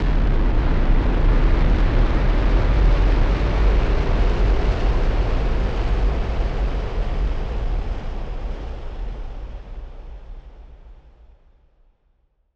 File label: Noise Bomb Rumbler; musicradar; bomb factory